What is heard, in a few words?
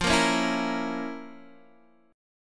F Major 7th Suspended 4th Sharp 5th F, Guitar Open D